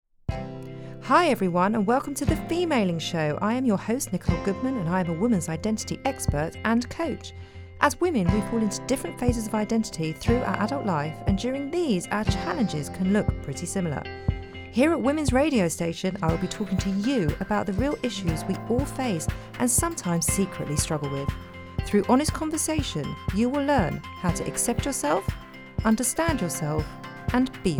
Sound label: Jingle